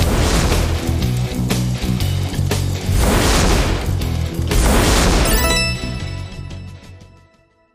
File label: superwin; Wazdan